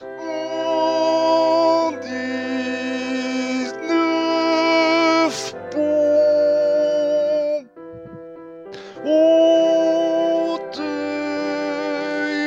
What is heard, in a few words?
Tenors Amour en 19 ponts